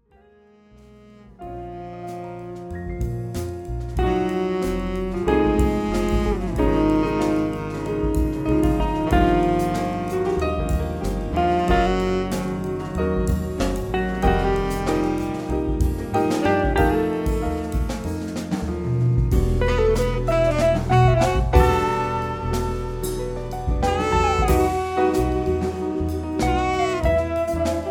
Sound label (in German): Live Quintett ( Sax, Guitare, Klavier, Bass, Drums) Konzert